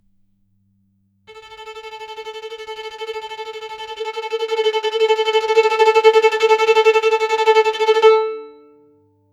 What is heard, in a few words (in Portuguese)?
toque unico rapido em uma, musical instrument